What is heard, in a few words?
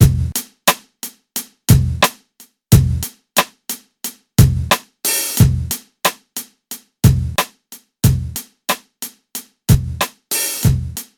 Drums